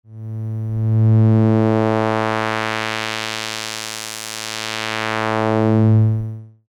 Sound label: BP Filter sweep 6581; SIDizer